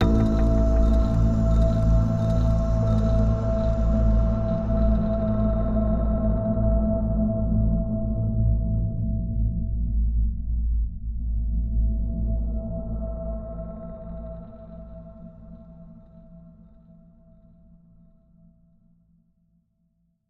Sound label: Stinger Trading Post Offer; Unto The End; Xbox Series X|S